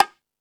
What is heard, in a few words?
Jungel Percussion, E-MU Pro-Cussion; drum machine